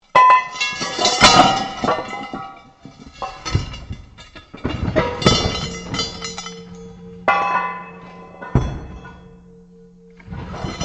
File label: METAL ON METAL LARGE COLLAPSE; Materials